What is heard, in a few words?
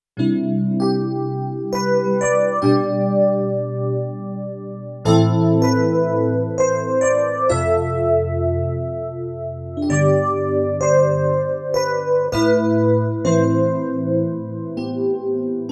Yamaha P525 DX E-Piano